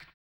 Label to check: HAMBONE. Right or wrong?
Right